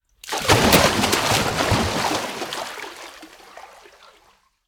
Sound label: miss; RS Games Sounds; Battleship